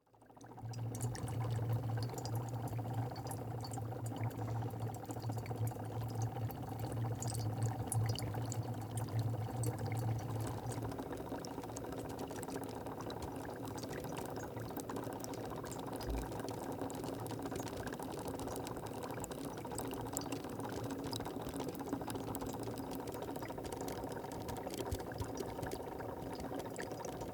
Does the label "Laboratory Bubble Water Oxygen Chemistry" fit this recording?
Yes